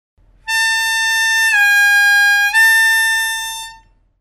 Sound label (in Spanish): bend asp, armonica